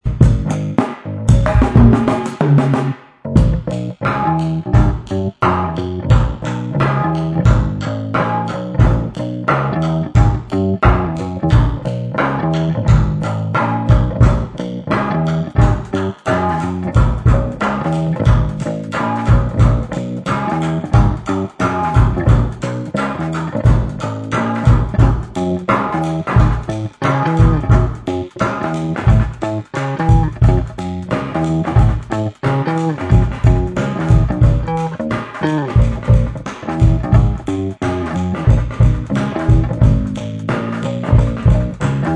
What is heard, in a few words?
2 Toms bass